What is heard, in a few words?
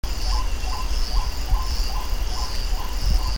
Odontophorus capueria